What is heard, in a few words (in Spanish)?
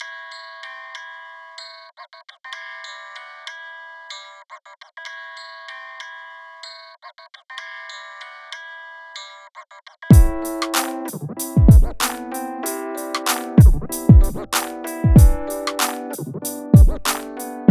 rap original